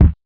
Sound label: Concertmate-670 Kit Kick, One Shot